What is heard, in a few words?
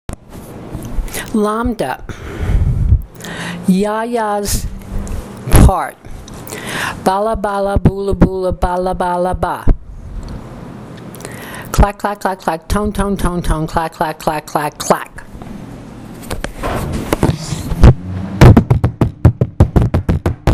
clacks tones, DRUMMING GROUP; LAMBA; YAYA PART; performance pieces